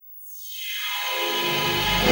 Misc Short FX

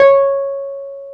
PNO, Wurly

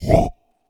SPRAY CUP, percussive; Rarefaction; Poke in The Ear With A Sharp Stick

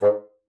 G#2, BASSOON; Xsample VOL-6

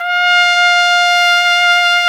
BRS TRUMPETS, Roland LA

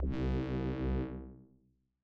Bass 2 C1, Belgium Beats 132bpm; Big Fish Audio - Old Skool House; OSH Construction Kits

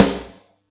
Yamaha EX-5

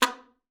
SLPA B3, ALTOSAX; Xsample VOL-2

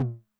High Tune Short Decay, 909 LOW TOM; classic machines; LT - LOW TOM

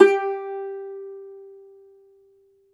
cumbus saz G, plucked strings; E-MU Producer Series Vol. 7 – Old World Instruments

